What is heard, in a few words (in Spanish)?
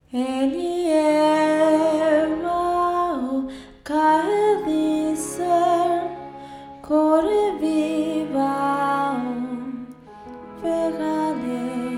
Cancion, Vocal; Komori; German